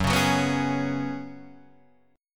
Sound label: F sharp Suspended 2nd Suspended 4th, Guitar Standard; strummed; JGuitar